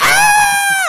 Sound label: scream